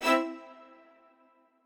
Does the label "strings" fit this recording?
Yes